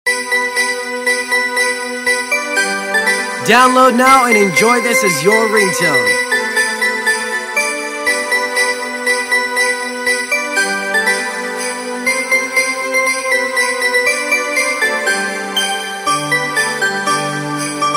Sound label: Jingle Bells Ringtone Instrumental; ringtones